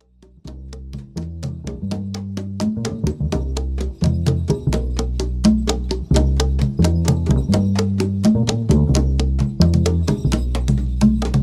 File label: yodel